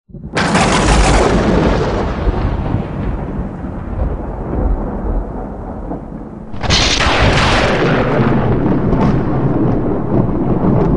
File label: thunder sound; Grant Evans